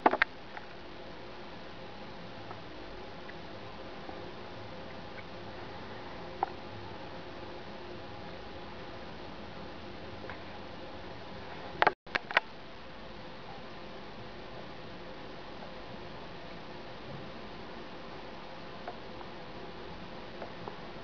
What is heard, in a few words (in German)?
Welle Windspirale